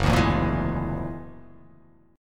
F sharp Augmented 9th F sharp, chord

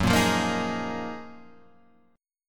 F sharp Major 13th Guitar Half Step Down

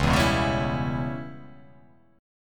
C sharp Minor 7th Flat 5th C sharp

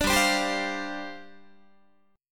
G 7th Suspended 4th G down Guitar; Listen to G7sus4 strummed; G7sus4 Chord

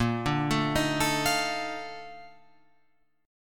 Bb Major 11th Bb Guitar Open F; arpeggiated; JGuitar

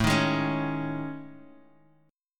A Major 7th Flat 5th, Guitar, Drop D; chord

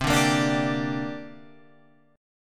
C 7th Suspended 2nd, Guitar, Open F; strummed; C7sus2; 12,9,10,9,10,9

